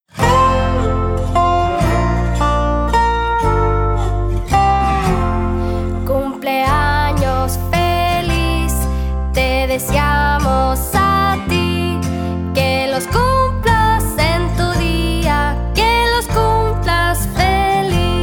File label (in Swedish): Latino, Spanska Latin